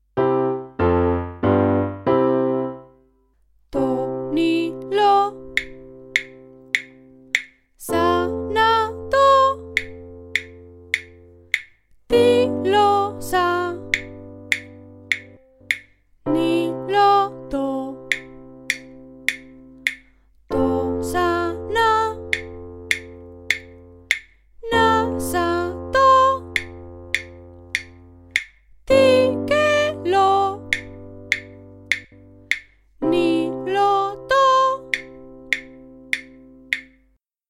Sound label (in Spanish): I IV V mayor sílabas tonales con acordes Do mayor